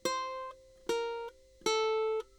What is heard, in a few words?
staccato, ukulele